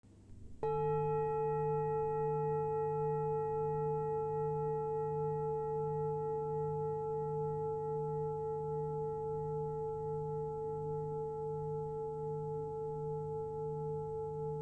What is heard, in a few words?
Aum Mantra